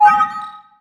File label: Holo Comm Chime